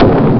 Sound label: blast; ST-XX Amiga Soundtracker Sample Packs